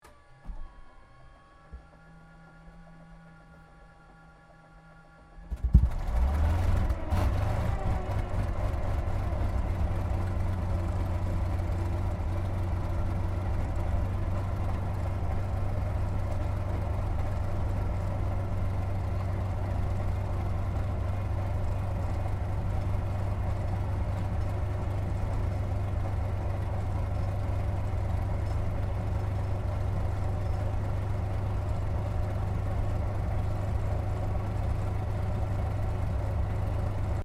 Aircraft P-40 Kittyhawk 1940s Startup idle Interior, WW2 Kittyhawk or Warhawk fighter plane; Airplanes